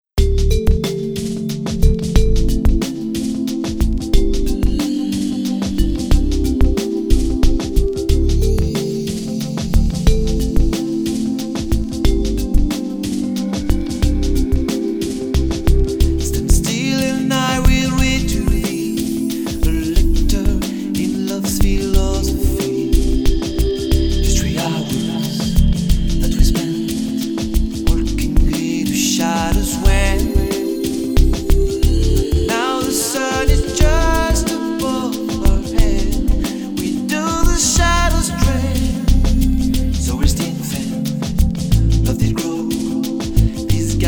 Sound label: vocal version